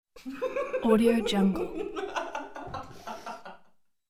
hysterical laugh

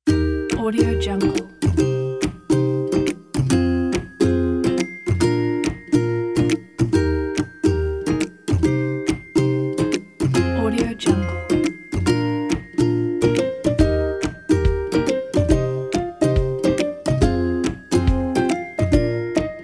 happy

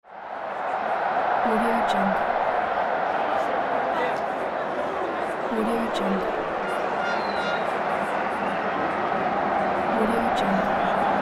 football crowd stadium

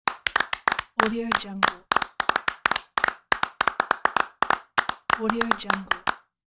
three people clapping

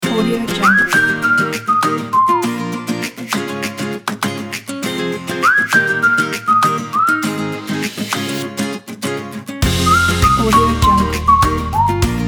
uplifting indie pop

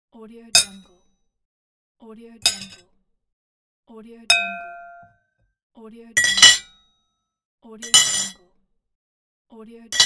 silverware hitting plate

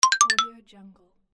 funny notification

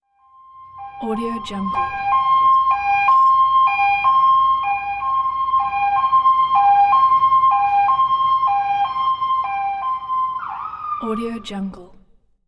police car passes by with siren; hollywoodedge

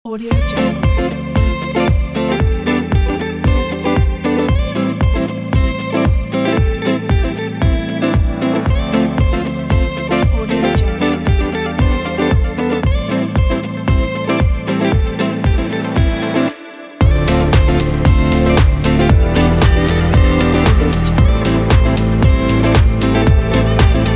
chill summer guitar dance pop

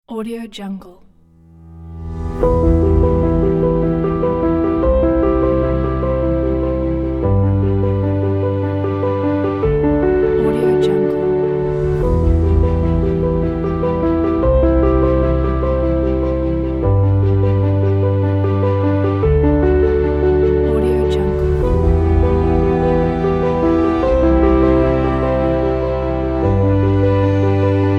emotional epic

